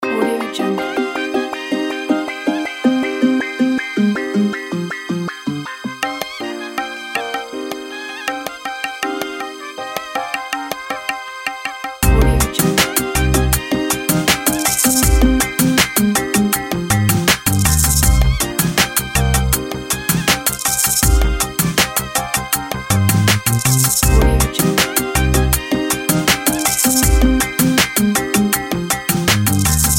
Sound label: latin hip hop ringtone